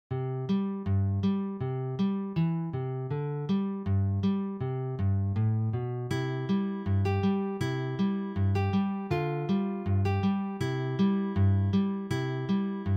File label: Studies for Ragtime